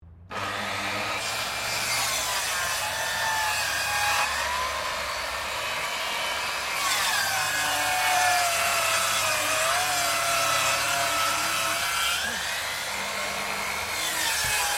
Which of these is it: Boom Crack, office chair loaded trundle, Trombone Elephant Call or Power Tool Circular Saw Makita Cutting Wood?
Power Tool Circular Saw Makita Cutting Wood